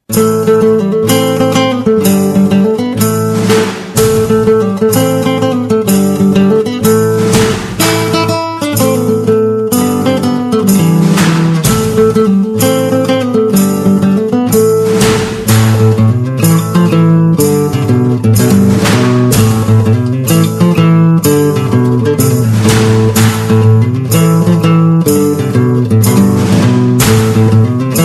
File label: Lg Classic, Ringtone